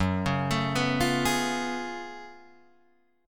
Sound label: Gb Minor Major 11th Gb down Guitar Drop D